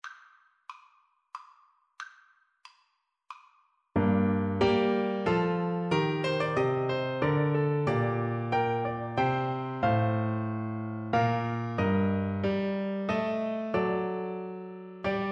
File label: purcell rondeau, Tuba